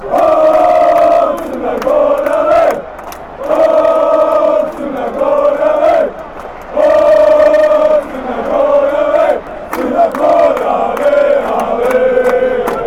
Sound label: montenegro chant